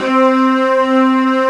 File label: STRINX; CMP - Analogon